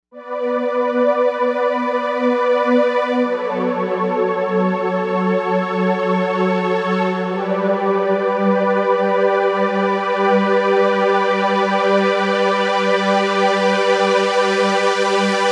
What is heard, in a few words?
Rise Up Saw, Novation UltraNova; Factory Patches